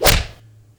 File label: slap hit; tf2